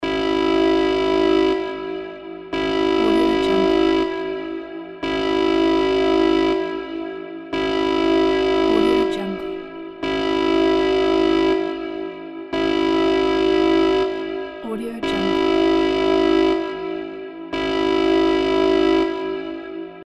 emergency siren

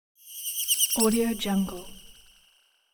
bird chirp warble pass by; hollywoodedge

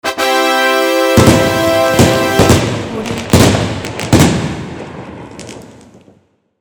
jubilant fanfare with fireworks

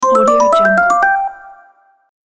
playful notifier up

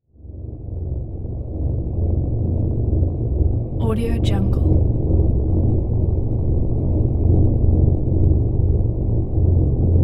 tension atmosphere

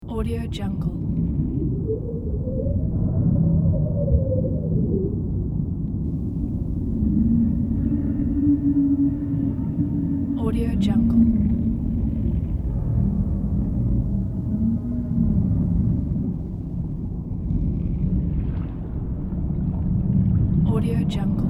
underwater ambience

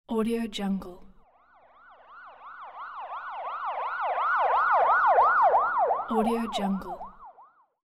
police car siren

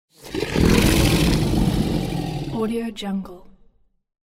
dragon roar

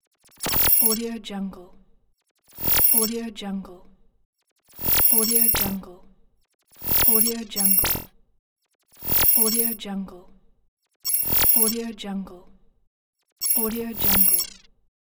digital text

